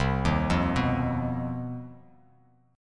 C Minor Major 7th Double Flat 5th C down Bass Standard; arpeggiated; JGuitar